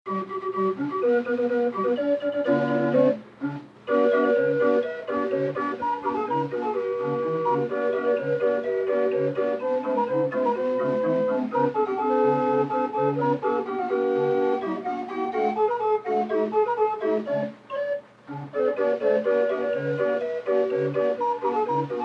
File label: Calliope Plays Marc; calliopes